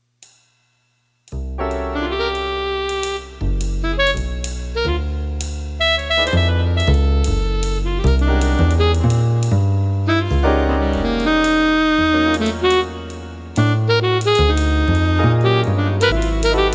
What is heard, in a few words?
jazz club music